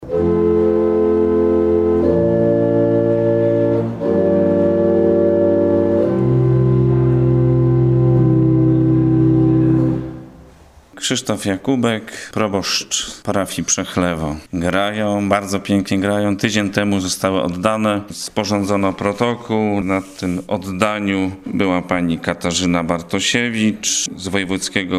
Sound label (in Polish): ORGANY PRZECH